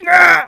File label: launch big pain; player; playersounds